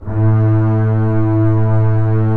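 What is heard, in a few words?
bass A1; Digital Sound Factory Virtuoso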